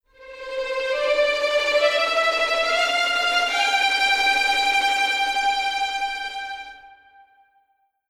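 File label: Strings tremolo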